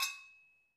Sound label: Brzl Agogo; Club-50 - Foundations Roland; Agogos; Fiji